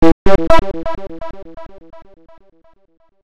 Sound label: Alarm; Evolution